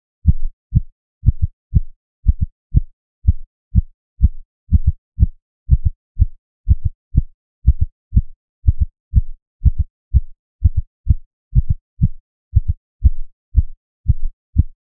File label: sound of heartbeat fast; media